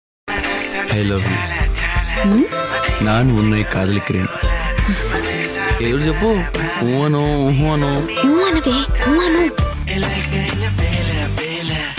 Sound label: bujjigadu bgm